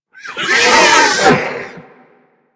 Snow Critter Vocal Death; Warframe; Hunting Critters

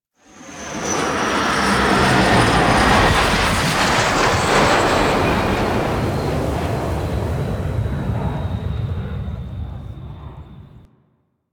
Drop Off, Vehicles; Zariman Liset; Flight

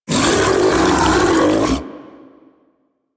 feast enemy pull; DE; Warframe; Warframes; Grendel

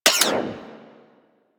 Mech, Weapons Rifle; Drake; Tiberon Prime

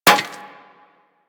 Bullet Impact Generic Metal; Surface

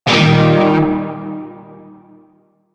Shawzin Pent Min Chord H; Music; Warframe; Narmer Shawzin

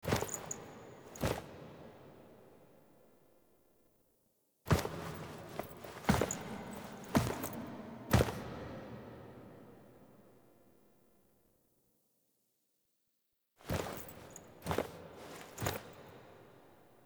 Foley D; Warframe; Quests; The New War; Sealab; Hunhows Lair; Drifter

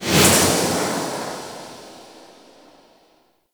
Void Arena Ghost Spawn; Warframe; Quests; Wraith Quest

